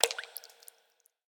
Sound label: gem Splash; imperialriches